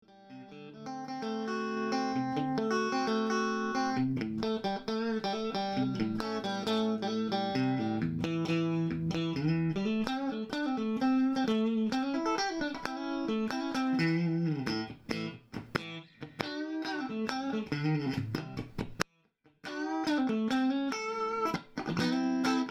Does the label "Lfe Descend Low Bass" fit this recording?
No